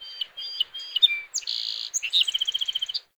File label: wetland forest day bird close; Ambience